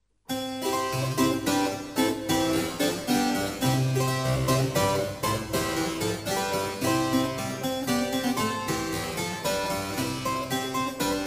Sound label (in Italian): Ciaccona, harpsichords